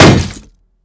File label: shield light block big